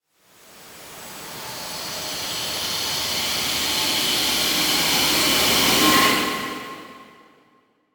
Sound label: Riser; Risers; Blastwave